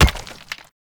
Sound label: Hammer Impact Rock; Weapon Impacts; Player; Environment; Tuvalkane Hammer